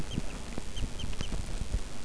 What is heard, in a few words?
crested whistle